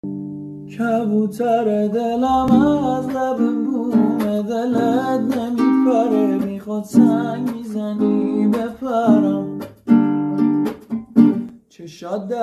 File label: gitar